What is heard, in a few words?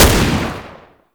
gunbrella shot; Weapons; Warframe; Tenno; Koumei